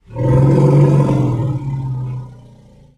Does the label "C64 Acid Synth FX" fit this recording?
No